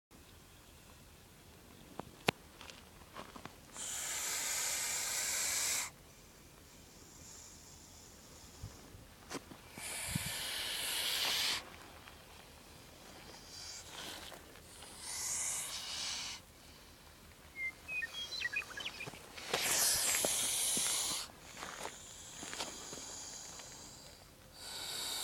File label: snake Hissing